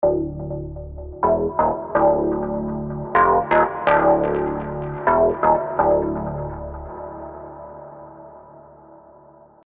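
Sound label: synth, spire old skool; Abyss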